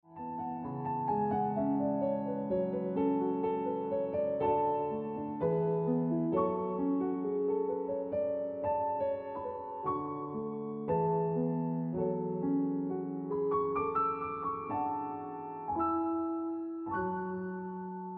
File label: Ringtone Piano Music